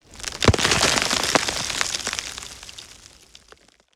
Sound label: dirt collapse; Foley Elements Rocks, Dirt And Stone